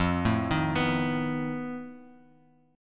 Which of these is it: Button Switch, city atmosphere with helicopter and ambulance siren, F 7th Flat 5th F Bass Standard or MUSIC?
F 7th Flat 5th F Bass Standard